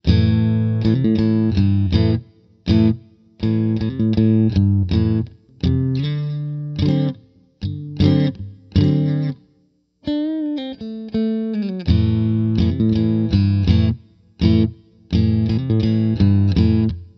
Middle Split Clean, pickups

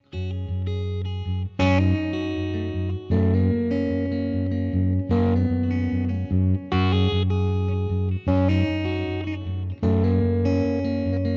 Clean Middle Am Std Tele

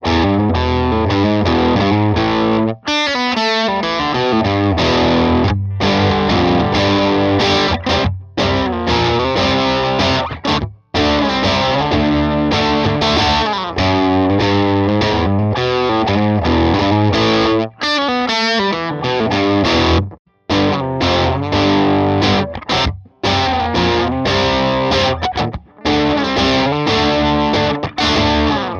amp drive bridge to neck

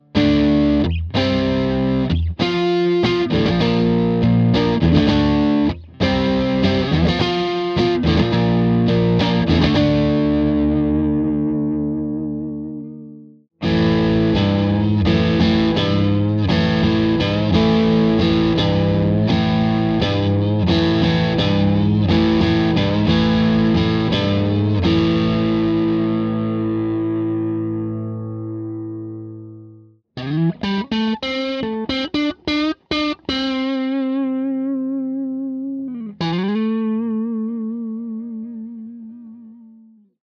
neck dirty, pickups

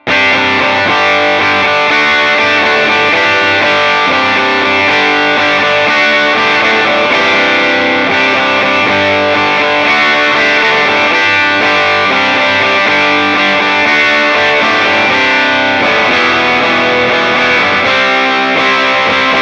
Overdriven 1973 SG Special; Revel Pickups

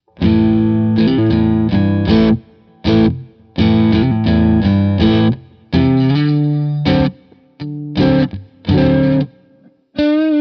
Neck Split Dirt, pickups

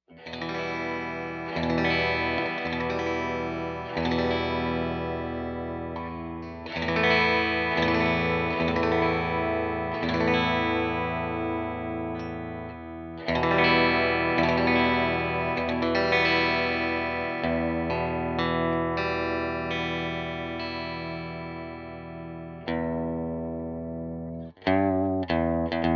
bridge clean, pickups

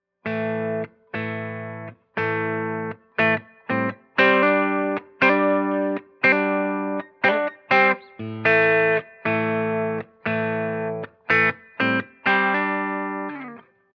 bridge, custom pickups

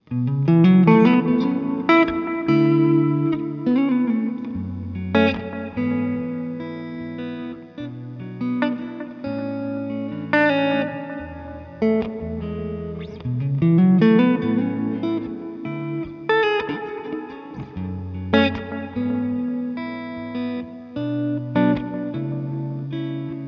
Neck Ambient

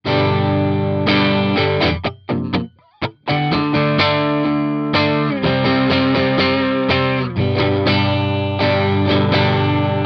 middle amp dirt

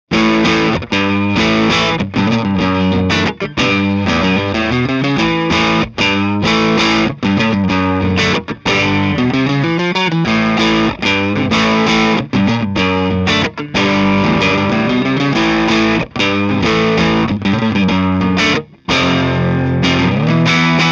Neck Light Dirt, pickups

